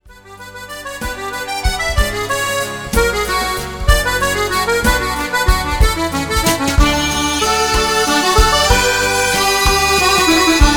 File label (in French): tourbillon celtique